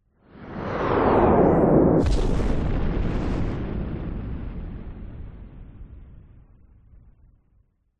warfare missile incoming whizz by overhead then distant explosion; zapsplat